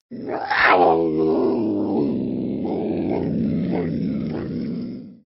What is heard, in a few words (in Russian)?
zvuk rysi